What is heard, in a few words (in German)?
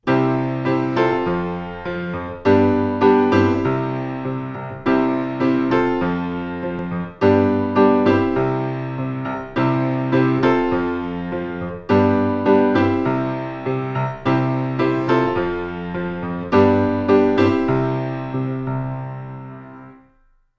Synkopen